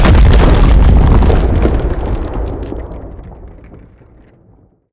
huge rock impact; Level4 Hidden World

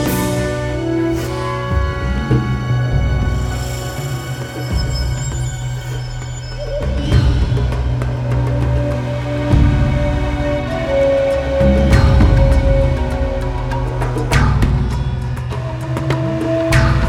Island Shore Orch; Crysis

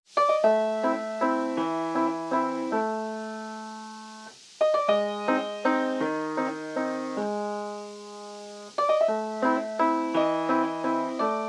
Clean Silent Slow Quirky; the movies 2005

